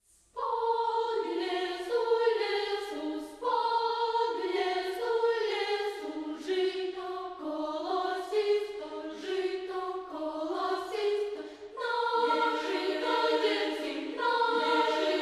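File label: Concert choir, Russian dance song; Russkaya plyasovaya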